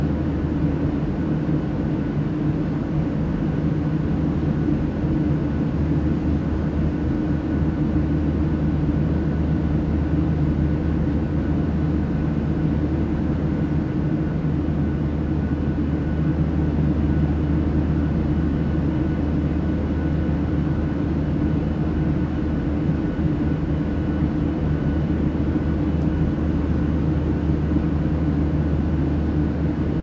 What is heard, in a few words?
airplane jet